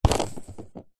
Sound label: sport skateboard wheel spin air by hand; zapsplat